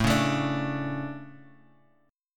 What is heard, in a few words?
A Major Add 11th A, chord; Aadd11; strummed; JGuitar